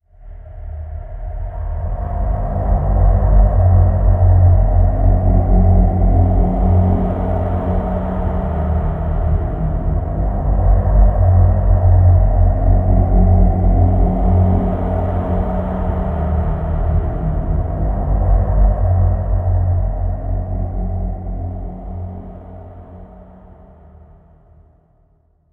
environment graveyard stinger winds long

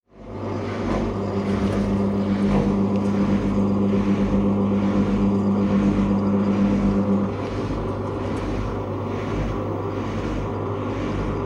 Carwash Power; this is the zodiac speaking 2020 gamerip switch ps4 xbox one pc macos

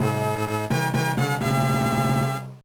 Gate Opens; Super Mario Advance 1; Super Mario Brothers 2

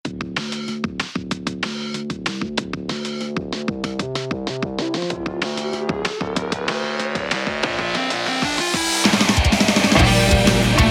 Anthem; Rocket League; Throwback

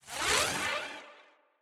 Death Twirl; portal quest; android; Shadow Minion1

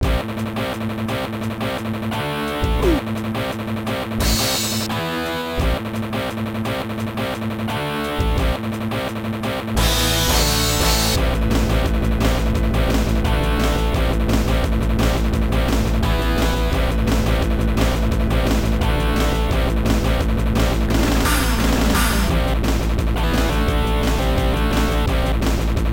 Singleplayer Race BGM, arcade; F1 Exhaust Note; System 32 arcade

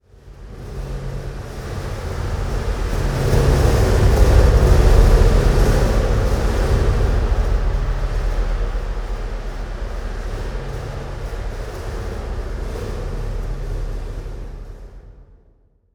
environment temple winds stinger indoors

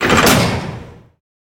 Grate Rise

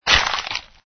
skeleton bone collision; Angry Birds Seasons

